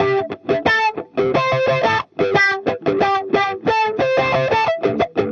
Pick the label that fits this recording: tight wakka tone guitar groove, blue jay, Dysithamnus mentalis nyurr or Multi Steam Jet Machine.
tight wakka tone guitar groove